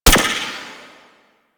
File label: Mech; Warframe; Void Trader; Gorgon